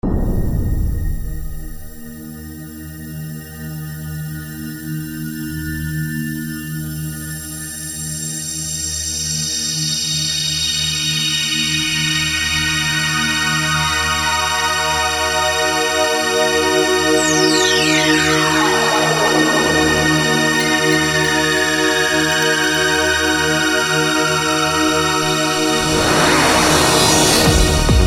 psy

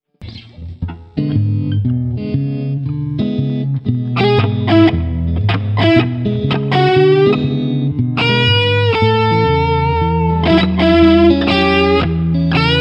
power of god blues

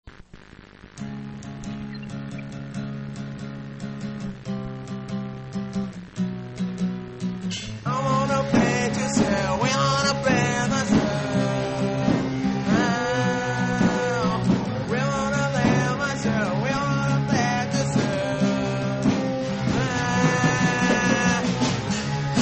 rock scream something

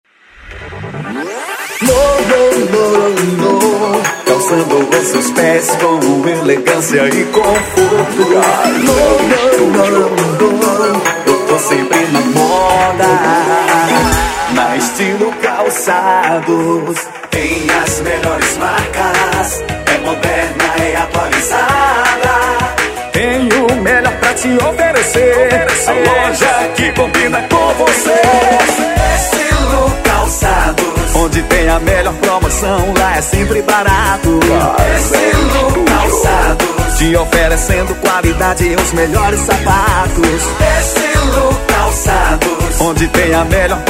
jingle; estilo calcados; kazam studio

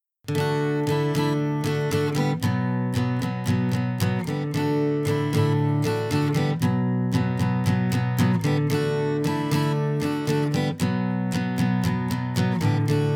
ballad

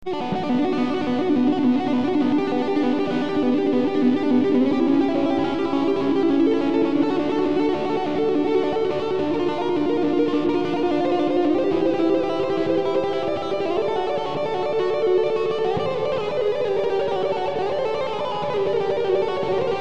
guitar tapping

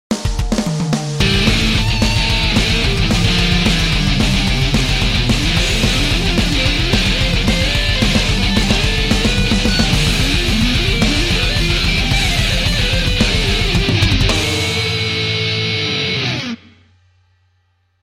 prelude

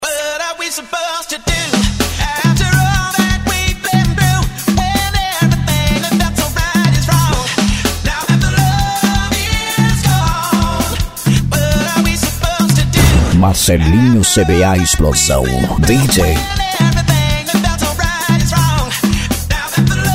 lambafunk

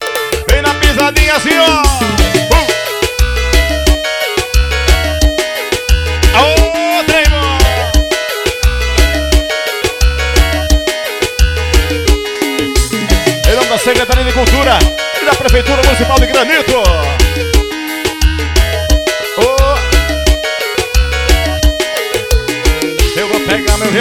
clave solo

